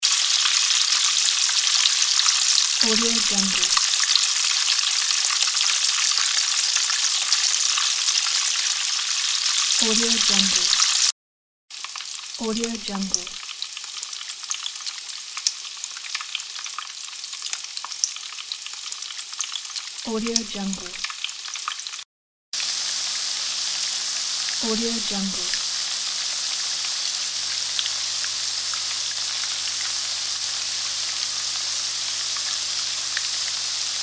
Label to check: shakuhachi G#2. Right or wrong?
Wrong